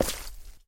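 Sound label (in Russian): мягкий близкий одиночный бульк воды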